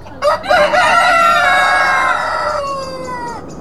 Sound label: Cockerells Big; Birds